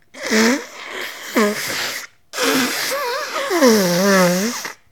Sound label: Runny Nose